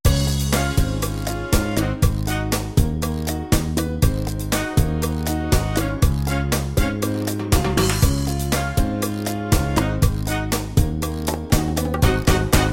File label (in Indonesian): Instrumen M1